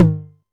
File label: Tom Hi, Novation Drumstation; Novation DrumstationV1